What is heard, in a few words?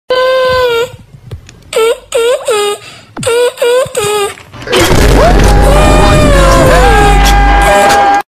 ringtone